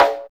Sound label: SNR